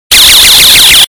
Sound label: alarm